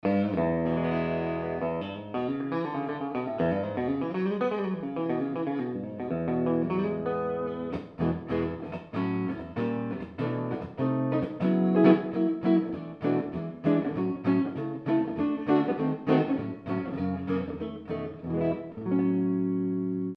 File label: EG1HB neck tapped, guitar